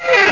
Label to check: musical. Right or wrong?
Wrong